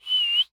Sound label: Human Male Whistle